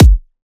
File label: Riddim Kick